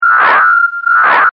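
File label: AMB LOOP, Sci Fi Atmospheres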